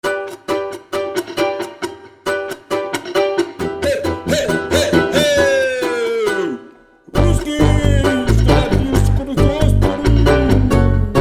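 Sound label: balalaika happy polka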